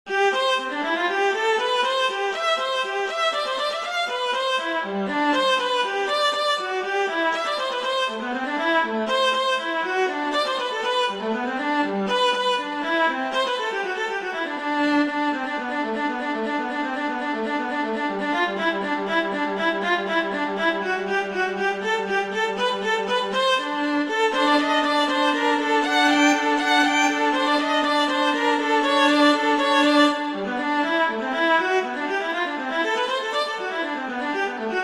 Gigue; Bach; Suite 3

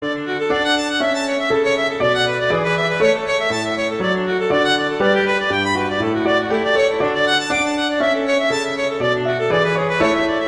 Allegro; Corelli

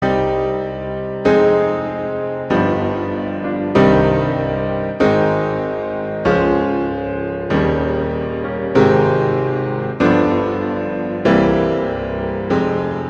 in C minor, Largo; Chopin; Preludes

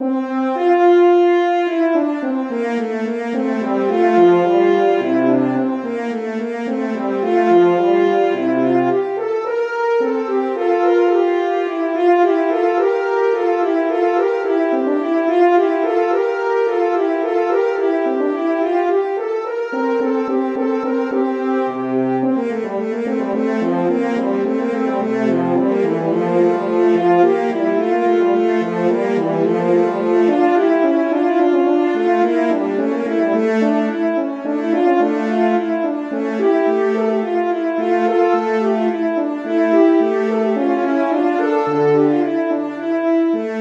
Vivace, horns; Telemann; Canonic

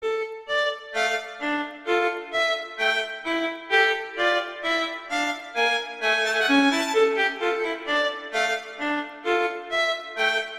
Marcia, Duet; Mazas; Duets; Duet 5

